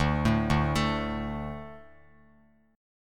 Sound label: D 5th D, down, Bass Standard; D5 arpeggiated; JGuitar